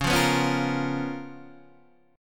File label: C sharp 13th C sharp, Guitar, Standard